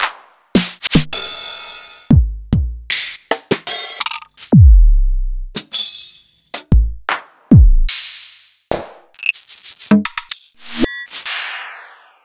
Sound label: EMU Orbit